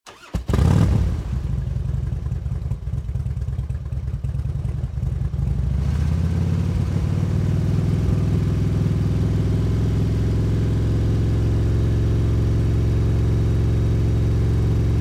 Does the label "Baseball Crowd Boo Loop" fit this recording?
No